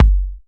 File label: Bassdrum; Vengeance Samples